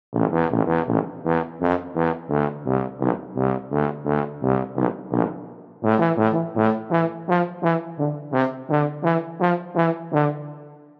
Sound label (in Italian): TUBA staccato